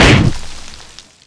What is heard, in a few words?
stone lump